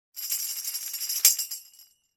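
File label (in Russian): трясуи и удар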